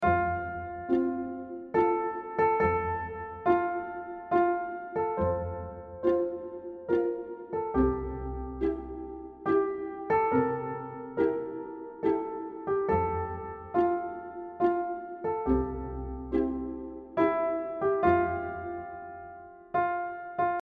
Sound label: glockenspiel